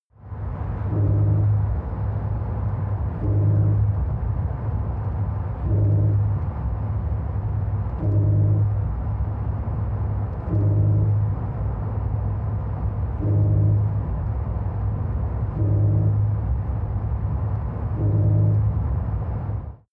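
sports car interior engine drive short thrusts increasing rpm low; verkehr transport; autos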